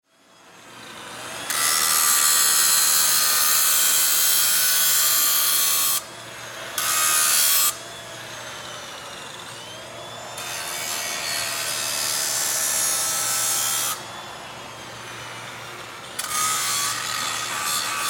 Industry Table Grinder On Run Off; Factories

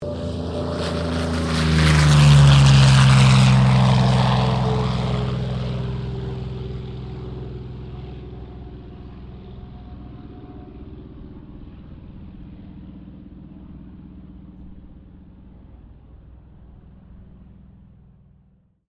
AIRCRAFT P-40 KITTYHAWK TAKEOFF; P-40 Kittyhawk / Warhawk / Tomahawk; Airplanes; Vehicles

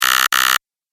Slow Buzzer Double Press

